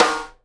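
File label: SIDE STICK, Live Snares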